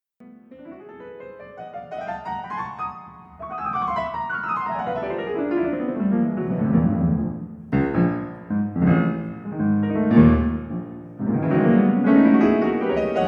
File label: piano music; Francois Frederic GUY; Marc Monnet; Odradek records